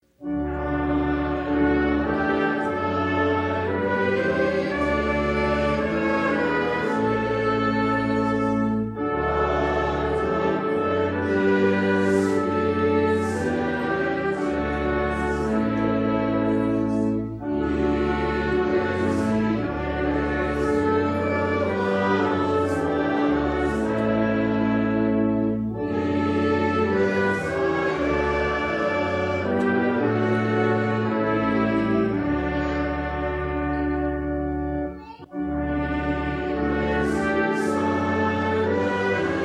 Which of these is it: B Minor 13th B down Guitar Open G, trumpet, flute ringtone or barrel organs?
trumpet